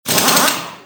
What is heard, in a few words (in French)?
Pistolet pneumatique